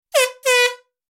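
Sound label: sounds of football horn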